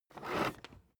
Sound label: vacuum cable drag out single